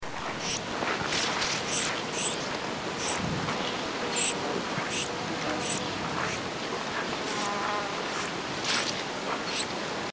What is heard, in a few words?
rusty bellied brush finch